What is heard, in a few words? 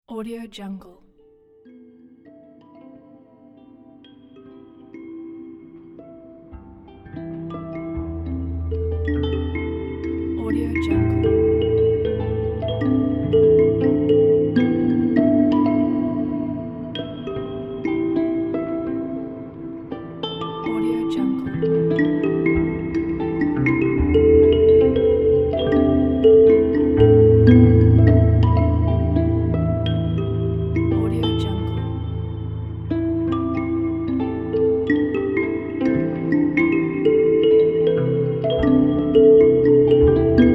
melancholic wind chimes ambient soundscape